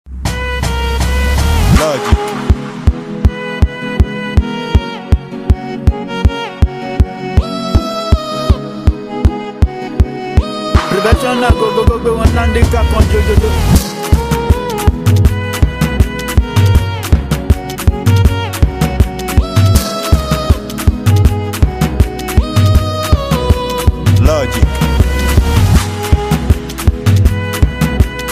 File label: Professional Beat - Violin Spirit